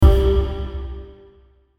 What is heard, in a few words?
hit stab low; Blastwave